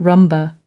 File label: rumba